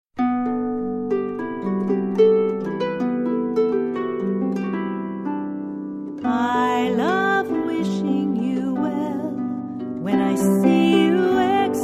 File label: wishing vocal